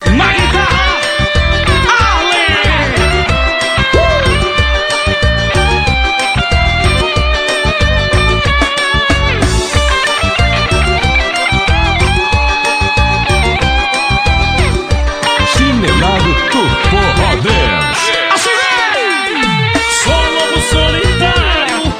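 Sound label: forró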